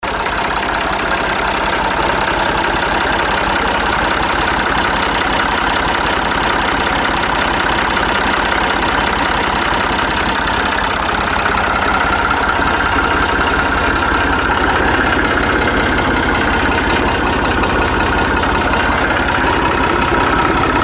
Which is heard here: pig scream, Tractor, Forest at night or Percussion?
Tractor